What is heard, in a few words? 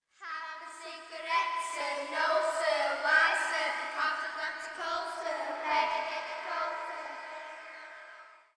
children singing; Dark Fall: The Journal